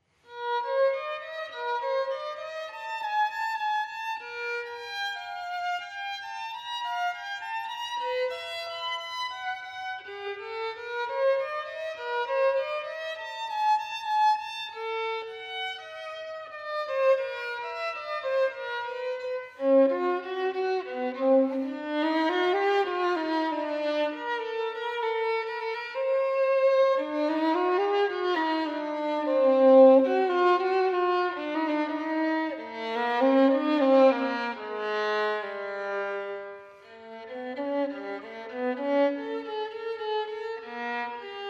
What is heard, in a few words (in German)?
Sonate Nr. 2 für Violine solo